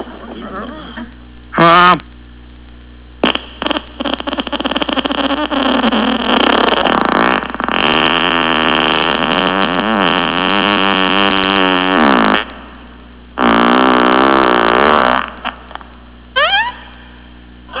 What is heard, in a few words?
fart